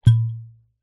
bottle glass empty finger pull from top; zapsplat

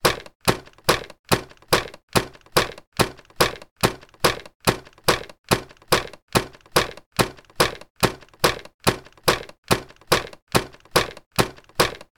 science fiction robot walk fast; sound effects one

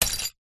impacts thud light into loose small metal pieces

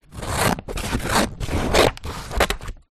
office scissors cut paper close up; zapsplat

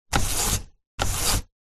household blind roller pull short x2; zapsplat

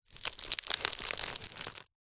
basket movement stress

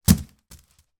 household laundry basket wicker fall over; sound effects one

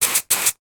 household polish furniture spray 2x short bursts; sound effects one

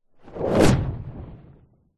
superhero fly by fast whoosh cape; zapsplat

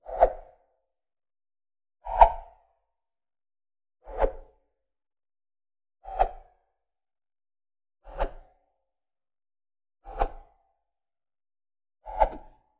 Whoosh Airy; bottlerocket

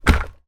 household pedestal fan down on carpet floor

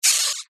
cartoon squeak

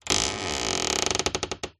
household door creak

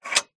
household gate bolt metal unlock; zapsplat

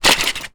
household medicine tablets pack foil box shake; zapsplat

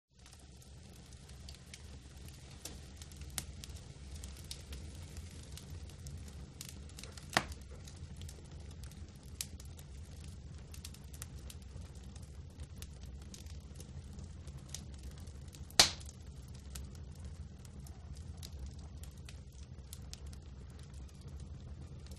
Fire Medium Wood Roar; audio hero

